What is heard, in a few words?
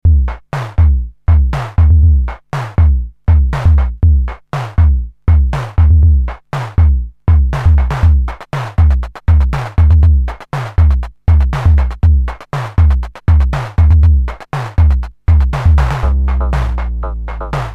beat